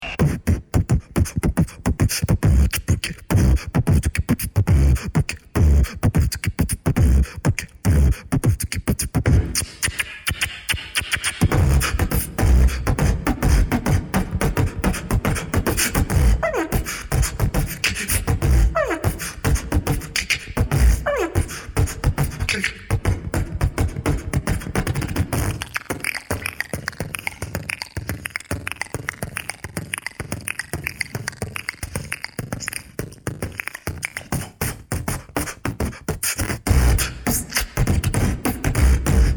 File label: Human Beat